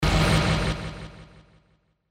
Burst Reverb

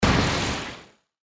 Burst Airy

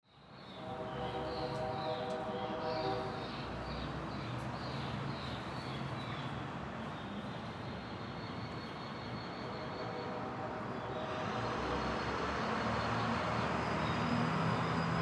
traffic

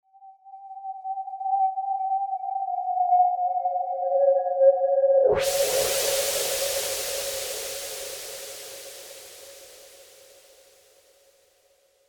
musical haunting

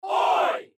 soccer hooligan

